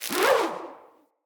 Rope Winch Rope Retract

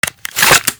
Reload, Weapons; Warframe; Acrid

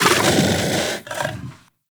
Kubrow Armored Vocal Breath Walk; Warframe

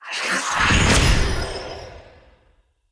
Staff Cast Long; Weapons; Enemy; Staves; Mnd Wazard Staff

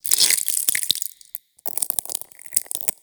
Infested Ancient Taunt Flesh; Warframe

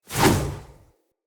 Sphere Polearm Throw, Weapons; Player

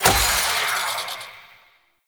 Lantern Destroyed; Warframe; Emissary Season Two

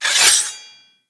Katana Unsheath; Swords

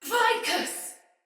Pain, Soldier Female; Battle; Barks; Dialog; Sinecure Soldier Female A

